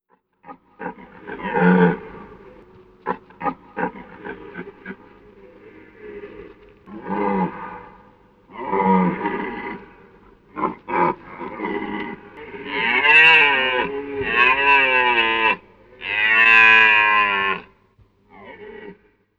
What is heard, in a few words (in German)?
Rothirsch Cervus elaphus